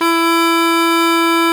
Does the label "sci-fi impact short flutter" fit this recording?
No